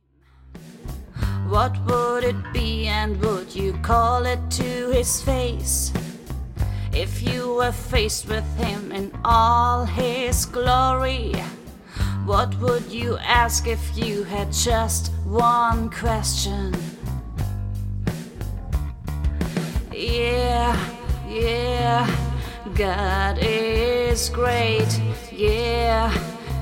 ohne ukulele

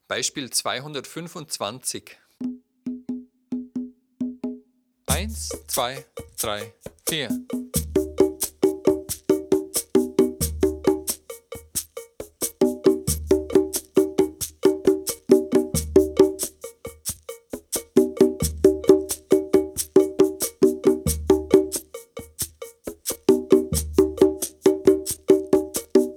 dreierrhythmus

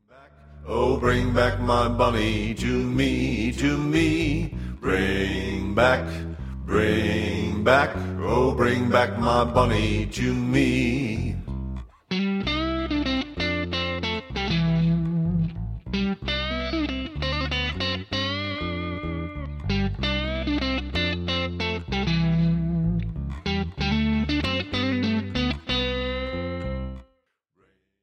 ohne ukulele

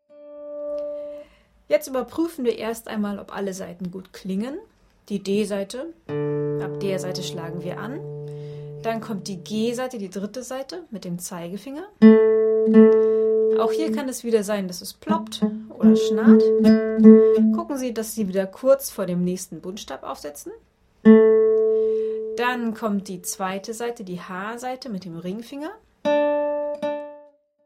der zweite Akkord D-Dur

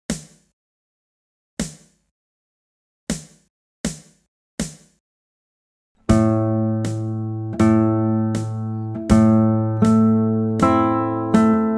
rhythmus langsam

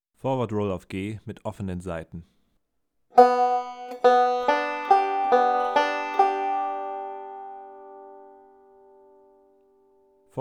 zupfmuster in hohe lagen transponieren

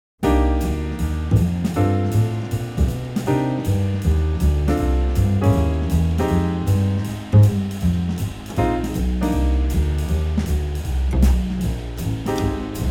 blues in c moll

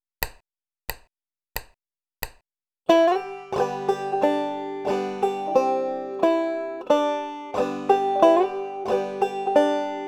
cripple creek mit hammer on pull off und slide

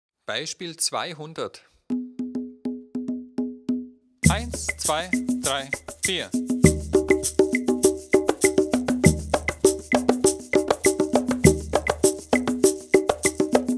viererrhythmus

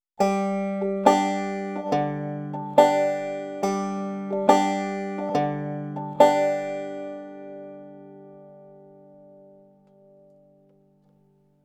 pinch figur mit wechselbass

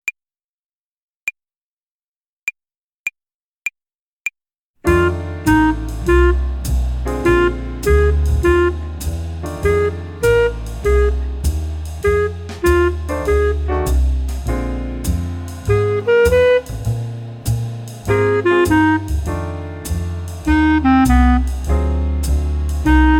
blues tonleiter uebung play along slow

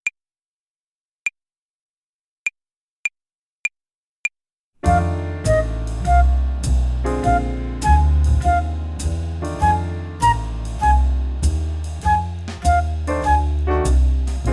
blues tonleiter uebung play along slow

